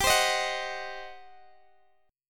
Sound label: G Major 7th Suspended 4th Sharp 5th, Guitar, Open D; x,x,17,18,18,16; down